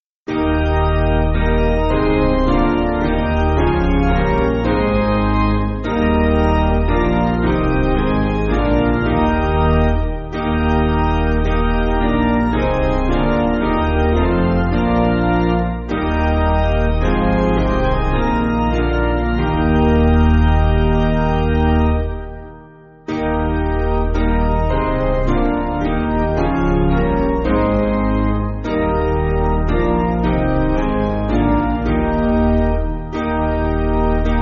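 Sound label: Piano, church backing; Basic Piano & Organ (CM)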